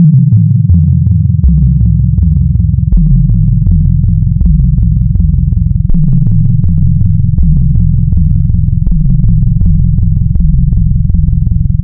Add Bin Add Sine, wavetables Additive; Architecture 32

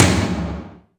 KORG 01W KIT; Rubber Chicken Software Sample Bank

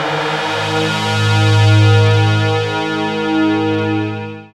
SYN STRAN, Dance Synth; Sound & Vision - Gigapack I CD 2

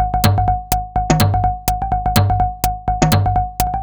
Top A# 125bpm, House Drumloops; 90 s Sample CDs; Zero-G House Fabrik Drumloops; ZG Drumloops

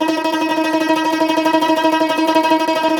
CUMBUS TR, Cumbus Tremolo

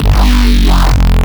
F Bass Shot; neuro; BIG5AUDIO

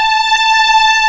A5 LEG, String Collection; East West; The Ultimate String Collection

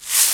Caxici, EMU Orbit-3 drum machine; 300 Drum Machines

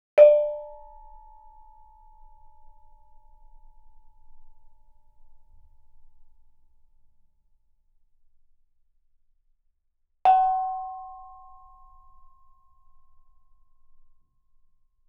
Gamelan; Propeller Island - Complete Gamelan

